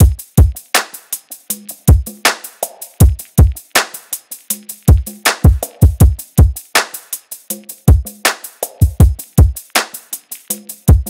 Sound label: drums 80, Full Drum Loops; Slate; SEISMIC Drum Sample Pack